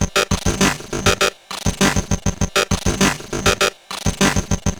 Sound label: Bent RX17 (100BPM), Circuit Bent